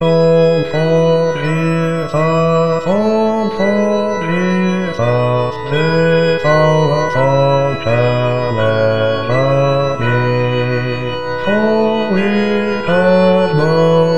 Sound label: All for Jesus Bas